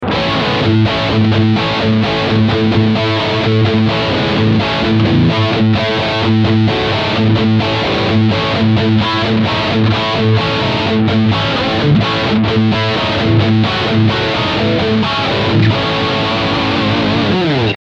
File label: Rectifier Modern